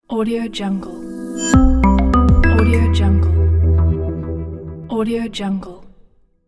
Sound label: television logo